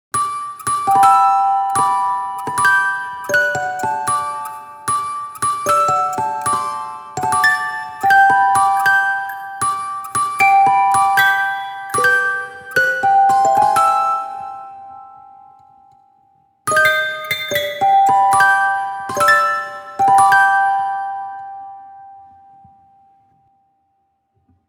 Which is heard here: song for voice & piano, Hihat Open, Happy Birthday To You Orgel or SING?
Happy Birthday To You Orgel